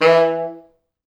E2, Pop Brass; Best Service ProSamples vol.19; AKAI

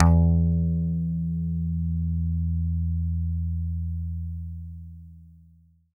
E-GITARRE, Alpine Volksmusik; Best Service

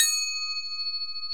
Crotales, Orchestral Perc

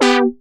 reason syn; Synths and FX; neuro; Sinistarr

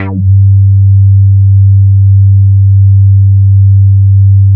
G3, Bass; Distored Reality 1&2

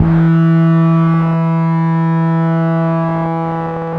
Dist Harmonic One Shot E, Bass; Bass X

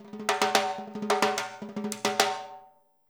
FILL, Percussion

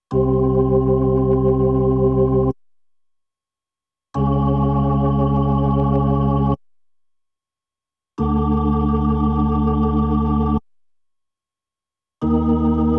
B3 Organ; Masterbits CLIMAX 8

